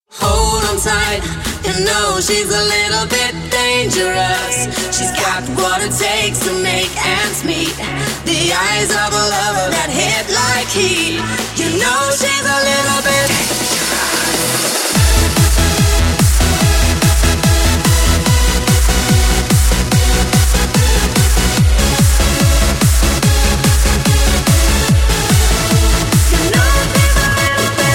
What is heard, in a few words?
dangerous ringtone